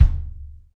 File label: Folk Kick; Roland BK-5